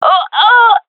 death, gendarmerie fem; vo; agents

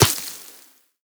sand impact bullet

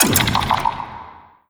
impact, physics, vault

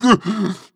breath short, zombie; creatures

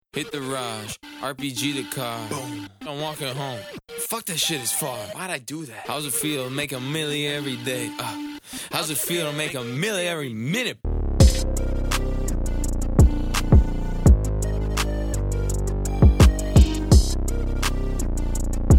bomb planted

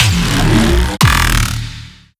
shockwave rise bass

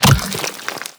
bite pull, barnacle creatures; Half Life Alyx

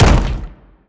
bash soft, brickwall, destruction

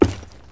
footsteps, wood panel; player

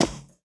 carpet, footsteps

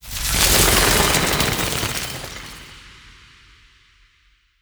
death disintegrate; creatures

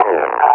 pain, combine officer; Half Life Alyx

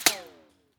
bullet ric; weapons; rics; Counter Strike Model Pack; CS2

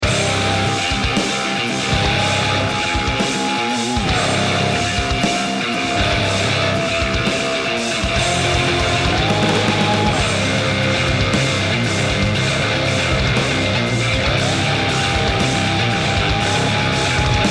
bomb planted; music; hades

